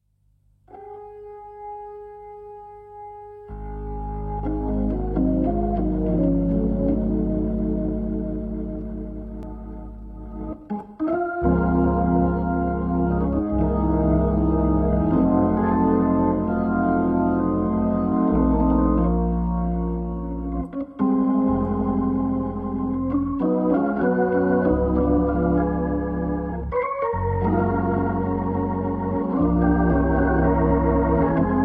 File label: Organ